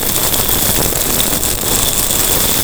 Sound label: Hair, noise; Logic Pro X; Alchemy; WaveNoise